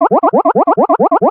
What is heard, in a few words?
Arcade Movement, Video Game Sounds